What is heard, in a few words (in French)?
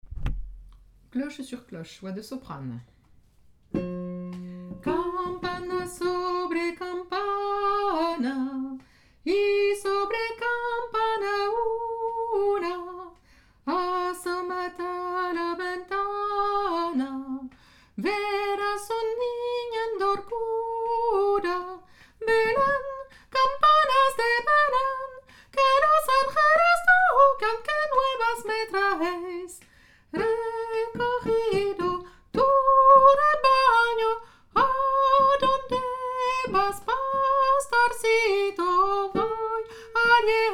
cloche sur cloche soprano, chants noel